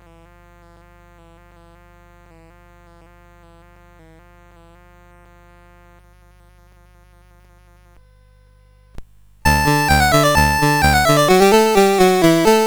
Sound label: Musette, CSG8580R5